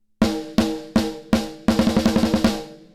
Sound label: Snare Punch; Xtressor